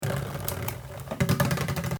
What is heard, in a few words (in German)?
leere Plastikflasche